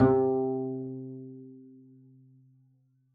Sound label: pizz c3, double bass; dsmolken